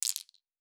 Objects Small, Fantasy Interface Sounds

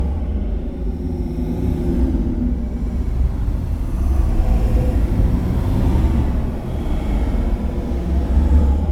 Loop Wave; KriptoFX; Realistic Effects Pack v1

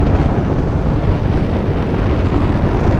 Loop Fire; KriptoFX; Realistic Effects Pack v1; PARTICLES

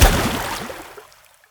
Explosion Water; KriptoFX; Realistic Effects Pack v1

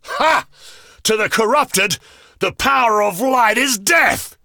corupted power; Bascileus

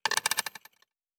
Coins, Fantasy Interface Sounds

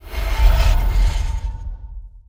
In Wave; KriptoFX; Realistic Effects Pack v1

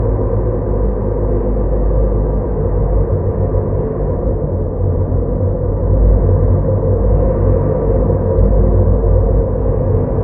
Loop Dark; KriptoFX; Realistic Effects Pack v1

